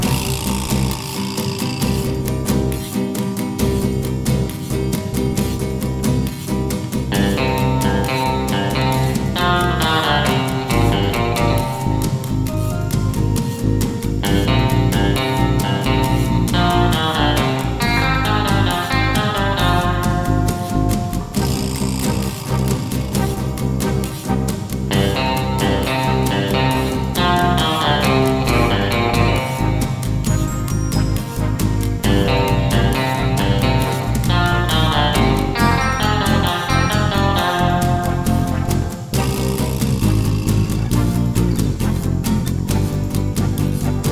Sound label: music action western; TyTheTasmanianTiger3; XBOX